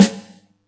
Yamaha EX-5

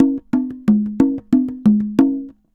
Congas Poly, Festa Latina, Drums & Percussion; Best Service; Festa Latina VOL-1

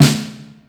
FAT METAL, snares; Instruments & Percussions; InVision Interactive; Lightware VOL-1

